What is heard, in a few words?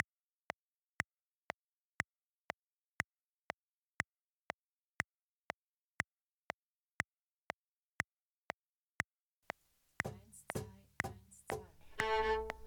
Play-Along Cajon; Perkussionist innen